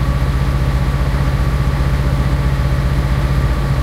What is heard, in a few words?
m18 engine int rpm 33 load, tanks; engines; War Thunder